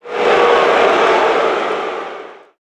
crowd react neg; Garry's Mod; tf2; passtime

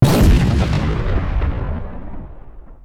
explosion petrol small, medium bomb; gbombs 5

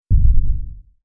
step, deer; quitoypongo; cursed deer phase4

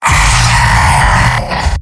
soldier m pain severe; tf2; garrysmod; mvm

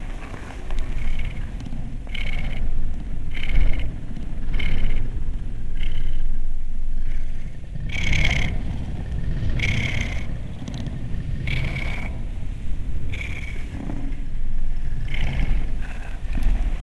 catnap purring; nightmare criters

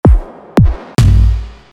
screamer; drumseratops

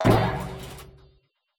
Vertical Jump; boxy boo v2

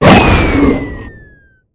spit; proj release; snapfire ult; the monster plane; kolmods

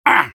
soldier taunt exert

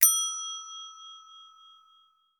item store add to cart

